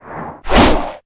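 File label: Attack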